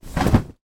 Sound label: foley blanket shake; sound effects one